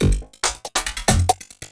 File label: LOOP, 140 BPM, Drum & Bass